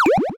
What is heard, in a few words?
power up; sky knight